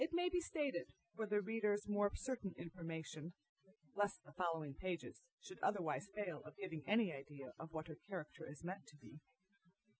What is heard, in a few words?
female inst sim; SiSEC08; SiSEC underdetermined